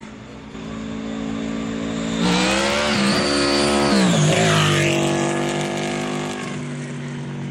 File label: Blown Vmax